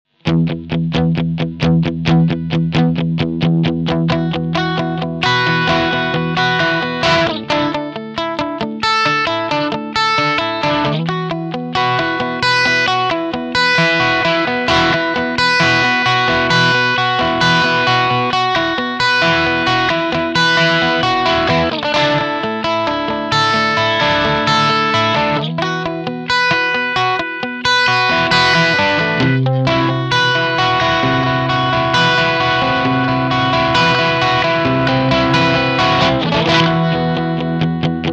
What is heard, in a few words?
Bypass; MAXON AD999